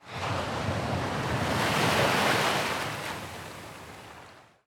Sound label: ambient battle; World Of Warships